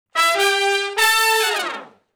Shuffle Blues Riff (A) high unison falloff, Horncraft for R&B Sections